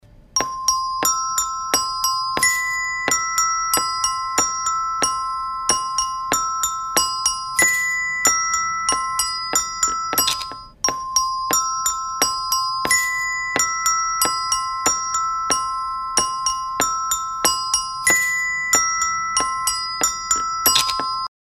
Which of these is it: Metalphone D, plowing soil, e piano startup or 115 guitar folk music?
Metalphone D